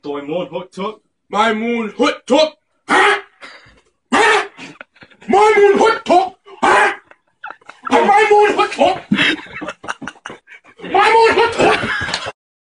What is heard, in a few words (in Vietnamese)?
Nhac nen